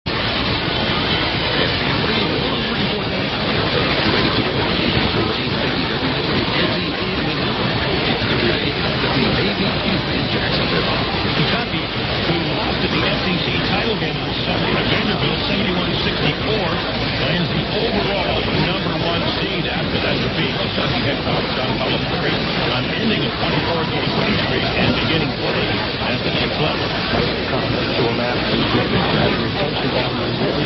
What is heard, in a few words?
New Bern NC; 1490 WWNB